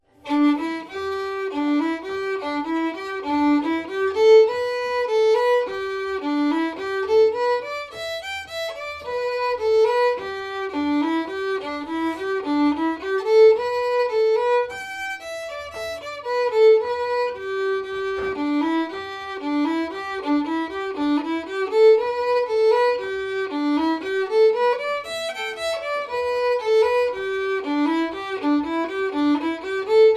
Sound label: scottish reel lent